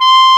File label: CLARINET C5